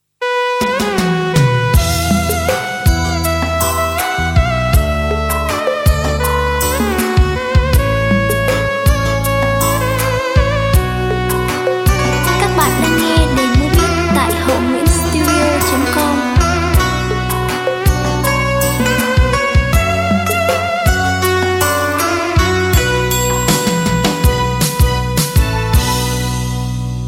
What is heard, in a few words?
beat, playback